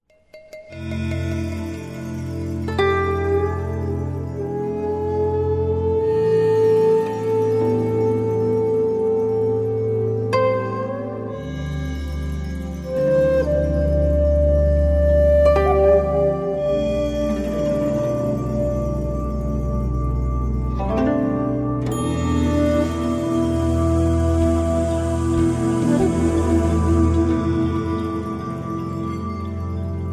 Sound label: Relaxation Buddhist Meditation Music